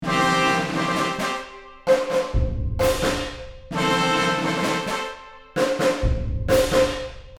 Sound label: Field drum and brass marching loop